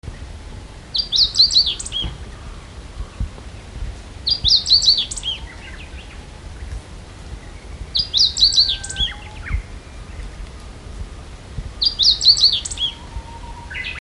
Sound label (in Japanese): hojiro